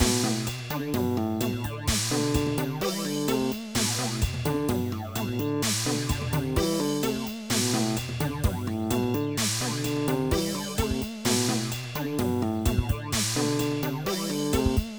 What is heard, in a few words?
Reason EDM 8 Bar Song